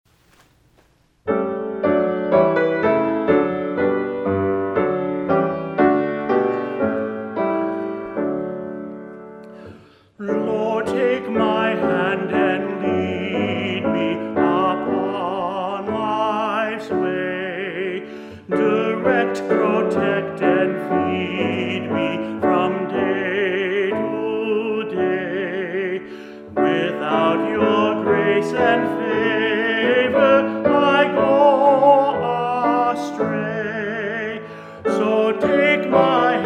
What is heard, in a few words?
Closing Hymn